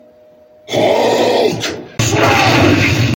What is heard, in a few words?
hulk crashes